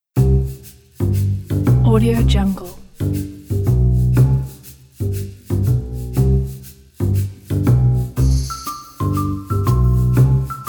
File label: circus